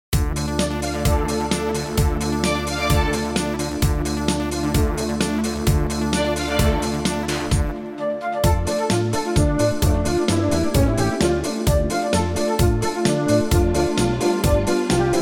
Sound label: plus brass